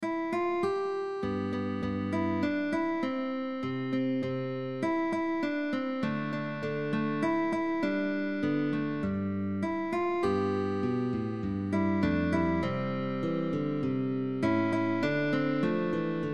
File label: Duo, MELODIC GUITAR GUITAR DUO: PUPIL and TEACHER; Right hand: Index and middle, Rest Stroke.Left Hand: First Position